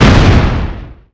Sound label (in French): explosion; impact